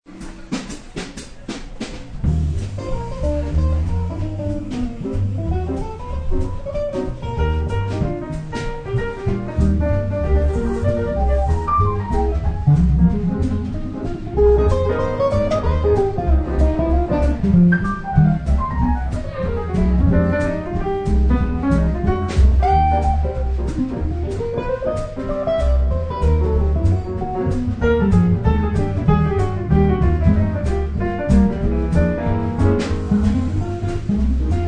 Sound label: Jazz band plays in dining area at a fine restaurant.; Jazz Lounge; Bars and Clubs